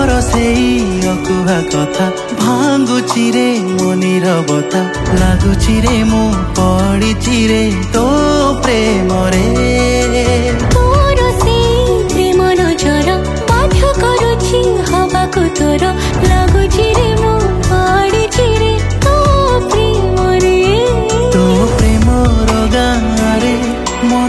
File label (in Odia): Studio Version New Romantic Song